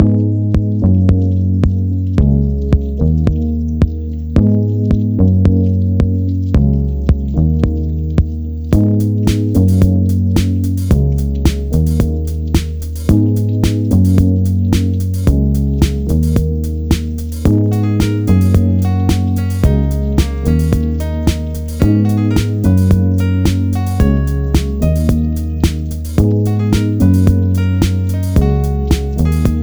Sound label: rainy day beat